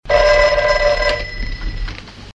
rings, old distorted rotary phone

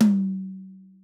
hi tom f sharp key; tom drum samples

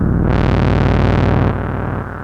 monodelay a sharp m 116, synth; loop

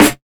Original Acoustic Snare D Key

